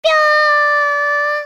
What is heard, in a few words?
flashbang; csgo; custom radio